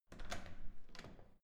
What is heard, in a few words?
security door open soft